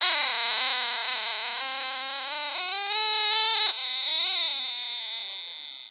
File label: krikree growl